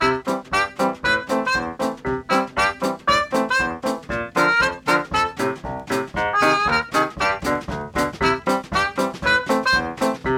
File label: survival music band; Pix the Cat